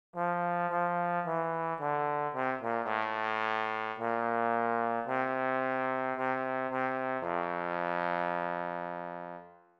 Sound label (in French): psaume trombone